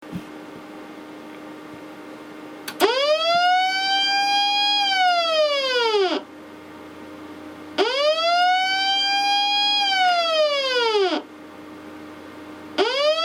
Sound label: siren